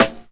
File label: old school raw hip hop snare A key; hip hop snare drums